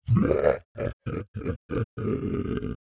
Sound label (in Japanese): 怪物 笑い声